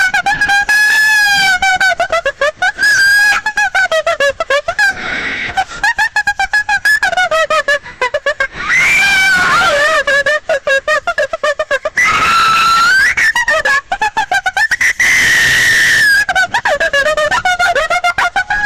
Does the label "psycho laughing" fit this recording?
Yes